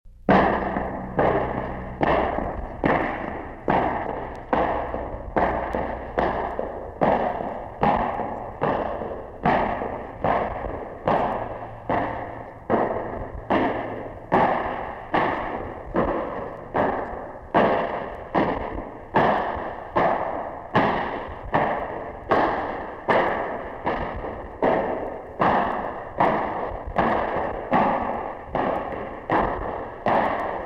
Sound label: Rhythmic Stamping; Tanglewood Press